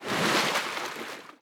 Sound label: Splash, Water; Barotrauma; Subsurface